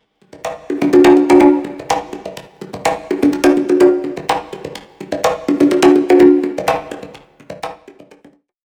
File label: conga